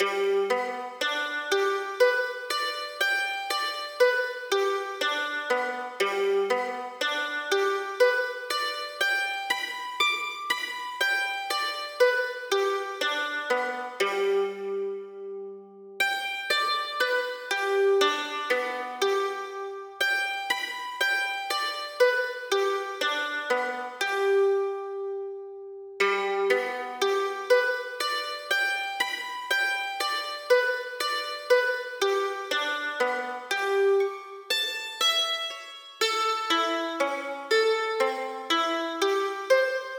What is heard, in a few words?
Mandolin Arpeggos